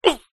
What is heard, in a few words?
human fight karate vocalisation male